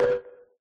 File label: start; Minecraft; 4jcraft